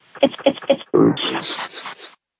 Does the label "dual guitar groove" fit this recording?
No